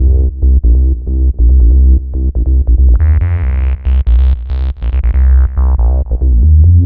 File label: Synth Ultra Bass